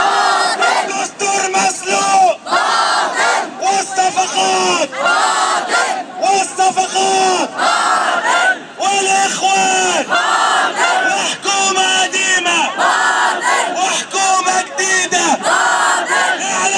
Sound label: chants from anti morsi demo in alexandria